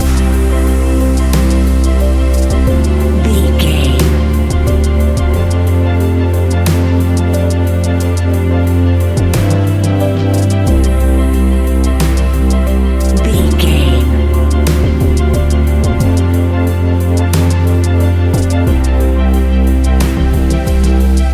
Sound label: modern ambient loop